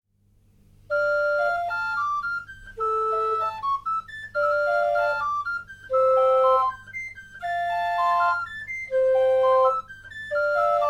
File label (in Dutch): Woudfluit